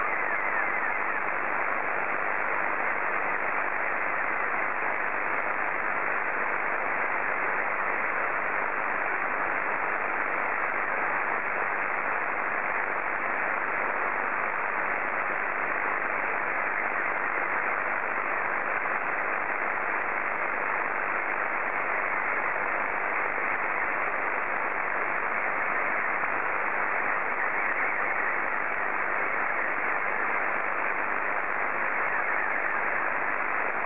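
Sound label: Scottie 2, slow-scan television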